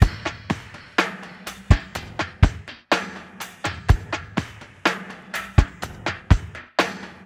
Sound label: vs loops processed